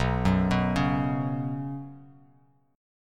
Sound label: B Suspended 2nd, Bsus2 chord; arpeggiated; JGuitar